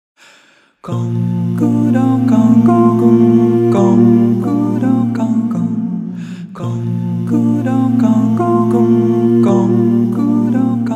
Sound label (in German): Sopran; Campana